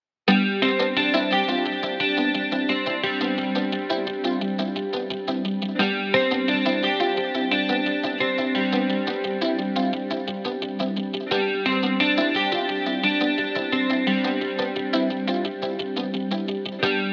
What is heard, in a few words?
guitar pattern 2 gt